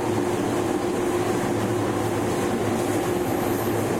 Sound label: E231 sub slow; tetsuon